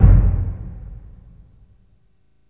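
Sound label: orchestral bass F; InVision Interactive; Lightware VOL-1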